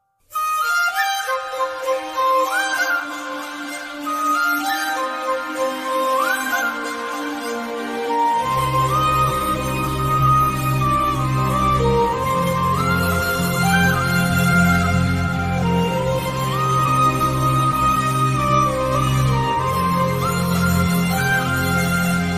sad bgm alone; raja rani; nayanthara

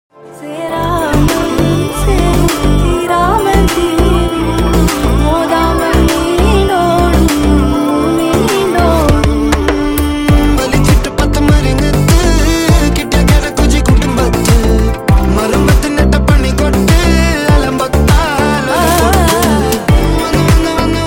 remix music

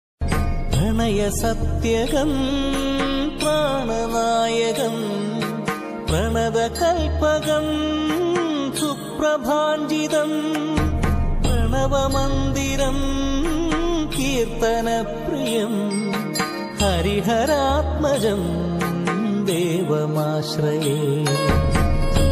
ringtone; harivarasanam vishvamohanam; tamil telugu malayalam kannada

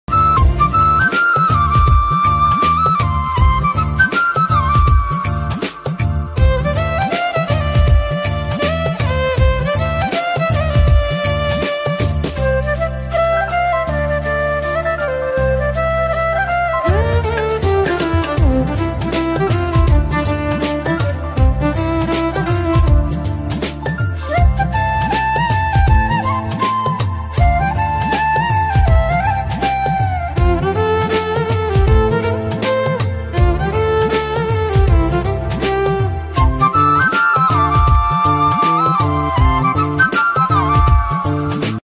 flute violin combo ringtone

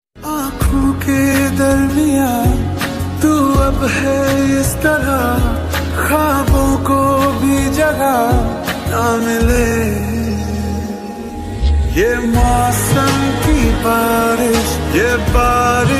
slow reverb song; half girlfriend

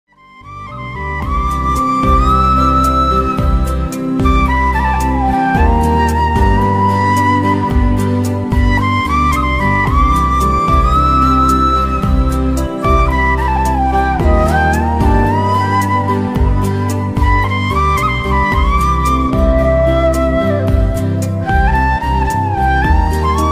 flute ringtone, flute bansuri ringtone; kalank

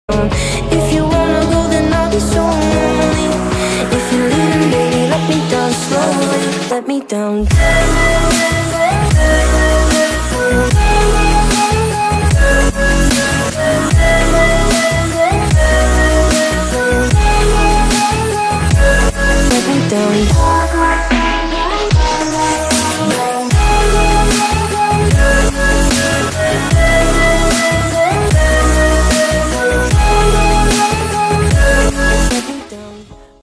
remix ringtone

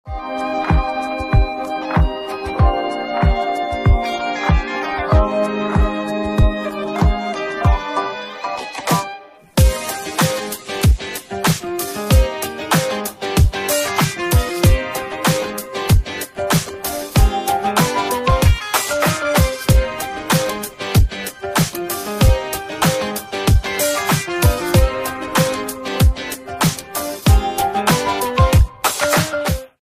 instrumental ringtone bgm

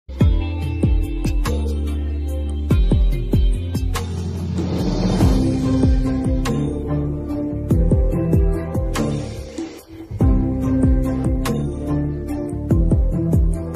ost ringtone background music

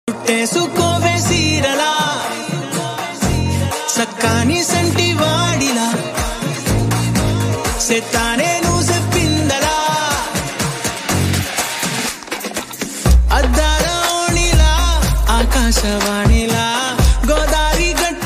telugu love ringtone romantic love song ringtone

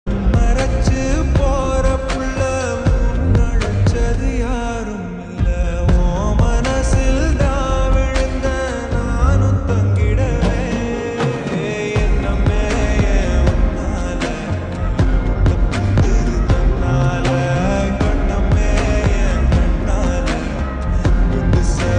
lofi reverb slowed

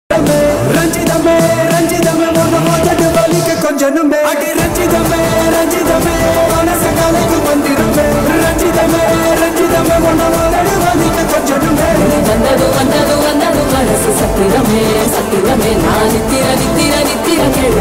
romantic thamil; sunny leone birthday status

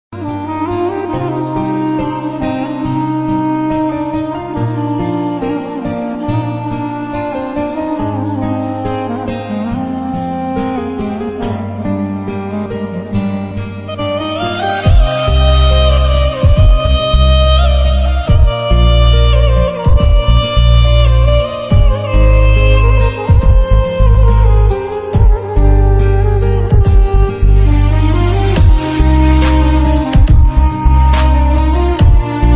ringtone slowed and reverb

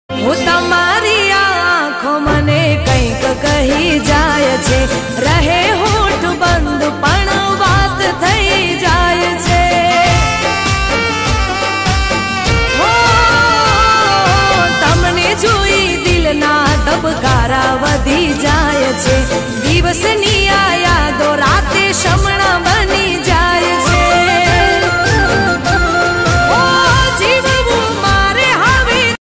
romantic gujarati ringtone; new ringtone 2023

